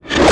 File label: nav bar flip